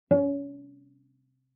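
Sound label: Cello pizz fortissimo sul G Db4; MIS Pitches - 2014; Strings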